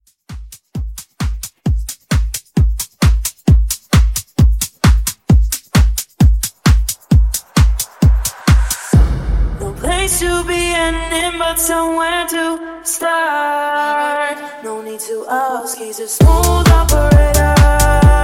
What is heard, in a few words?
80s Dance; Remixfreakz